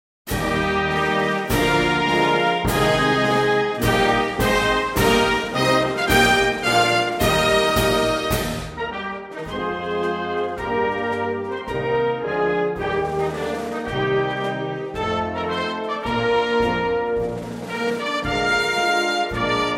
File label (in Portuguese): HINO NACIONAL DE PORTUGAL